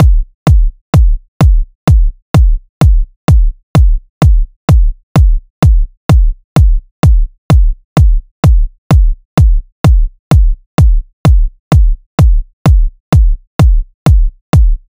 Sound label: Drum Loop, 128 BPM Kick, House; Loop Stems; Cymatics; GHOST (Beta Pack); Dogs Drum Loop